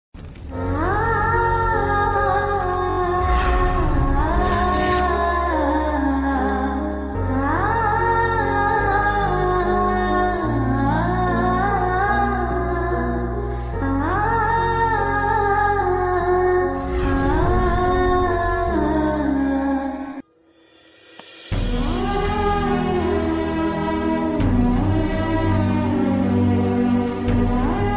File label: sad ringtone; policewala gunda 2